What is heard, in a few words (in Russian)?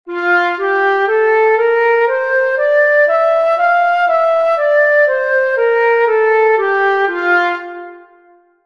gamma fa magor, flauta